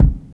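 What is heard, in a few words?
bass drum funk, drum kit; E-Lab; X Static Goldmine 2; akai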